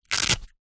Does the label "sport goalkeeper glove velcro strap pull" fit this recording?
Yes